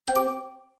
buffer switch

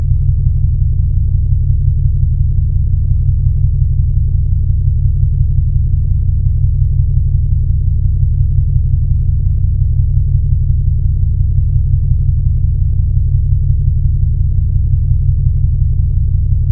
ammo chamber, ships rooms

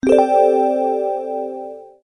military

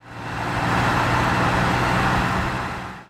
overtake, highway

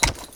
trot, horses

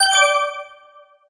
warning; nebula

